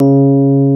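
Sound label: EP C#2 MP, electric piano; Greytsounds VOL-1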